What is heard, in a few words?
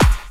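snare drum bass drum, Dance Mega Drums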